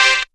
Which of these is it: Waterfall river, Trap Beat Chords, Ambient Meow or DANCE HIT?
DANCE HIT